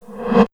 DRMSND, Underground Hip Hop, BEATKIT

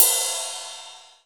RIDE, MONO RIDE CYMBAL

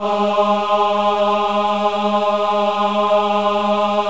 VIB G#3, VIBRATO, Voices; Spectrasonics

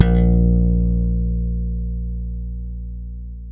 ALEM THB A#1, ALEMBIC BASS; Masterbits CLIMAX 7 - Bass

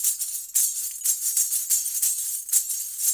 DANCE, Africa, Perc Loops; Roland LCDP14 Africa VOL-2; BTS Af.Prc.Loops

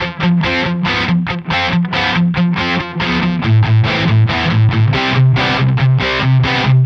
Distorted Guitar 140BPM Amin; Distorted Guitars; Vengeance - Essential Clubsounds Vol.4; Guitarloops

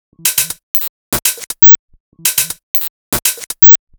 Buzzer, Percussion, 120 BPM; Sonic Foundry (Sony Creative Software); Underground UK House & Electro

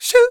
FEM PERC, Vocal Jazz FX; Spectrasonics Vocal Planet

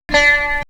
Pluck; Coldcut's Kleptomania VOL1

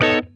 B9 CHOP, Funk Guitar; Zero G - Funk Guitar

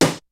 kicks snare, kid; Kid Nepro; Elite; KICKS SNARES

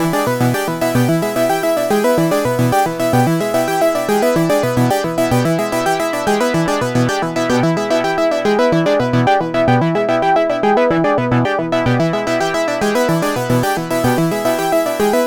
Lead

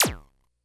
Bleep; Korg DS-10

